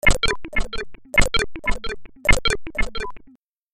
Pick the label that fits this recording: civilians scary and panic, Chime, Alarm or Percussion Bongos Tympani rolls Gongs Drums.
Alarm